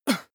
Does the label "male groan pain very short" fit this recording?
Yes